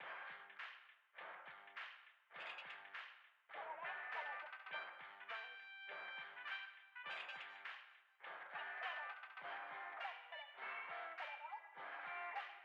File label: BGM Plaza Shooting Range